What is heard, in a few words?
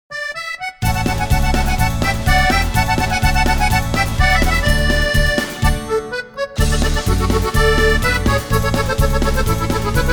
accordions; best service accordions 2